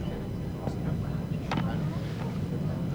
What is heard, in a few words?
Replimat Sounds; Trek